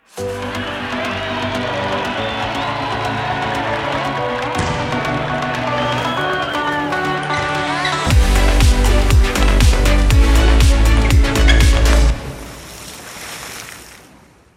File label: bed; Flamme olympique